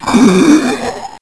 death; quake2; ranger

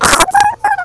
drown; quake2; hamster

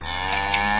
pain; quake2; bigmack

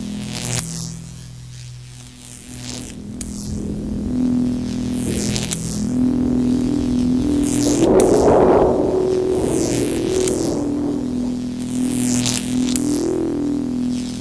halos; quake2

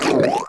gurp; quake2; zumlin

